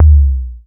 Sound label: Kick Long, Modular